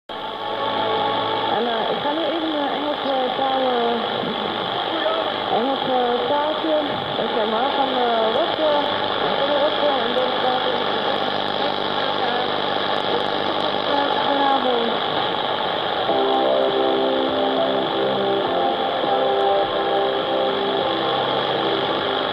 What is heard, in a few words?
3930 kHz, pirate radio; ladycontinental